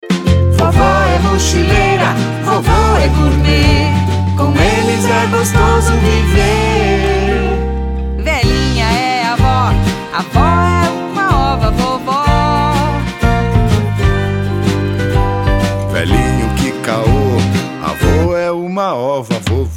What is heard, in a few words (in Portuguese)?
Jingle Dia dos Avos